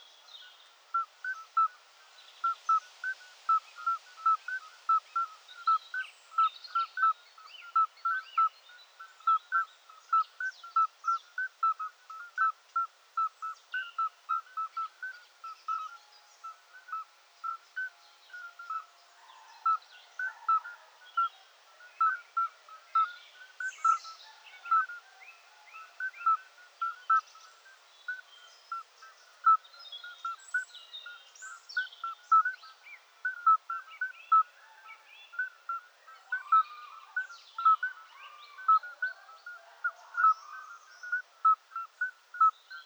Geburtshelferkröte, Stimmen; Amphibien; Froschlurche; Oliver Swift

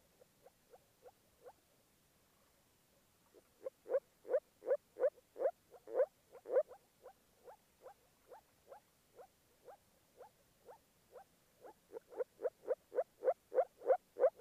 Moorfrosch; Amphibien; Froschlurche; Stimmen; Oliver Swift

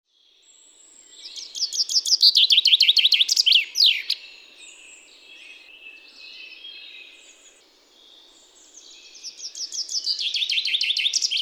Buchfink Gesang; Karl-Heinz Frommolt; Strophe konstant wiederholend, laut und schmetternd; Vogelphilipp; Vogelstimmen

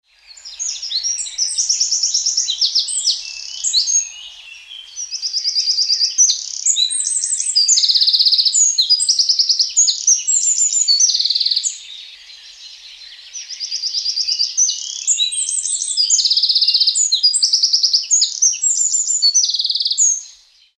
Zaunkoenig Gesang; Günter Tembrock; ist der Gesang in bis zu 500 Metern Entfernung zu hören; Vogelphilipp; Vogelstimmen

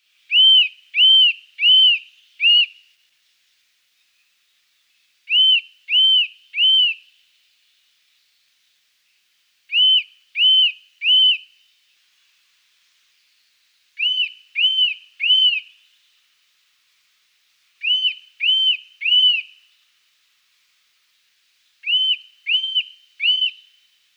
Kleiber Gesang; "Wiü wiü wiü" des Kleibers; Klaus Conrads; Wiehe; Vogelphilipp; Vogelstimmen

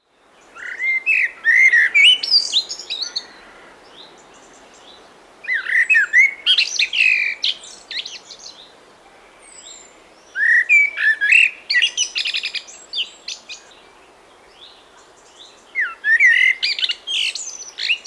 Amsel Gesang; „dürio diriiii – dürio dürioö diid“; Günter Tembrock; Vogelphilipp; Vogelstimmen

